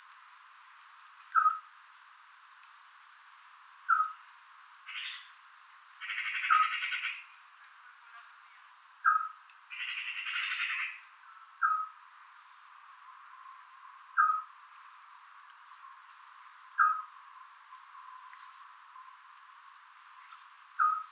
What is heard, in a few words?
call, scops owl, Background: Magpie calls and human voice.; these faint and short calls; otus scops